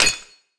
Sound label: grenade hit; weapons; valve